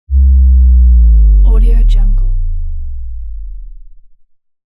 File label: bass drop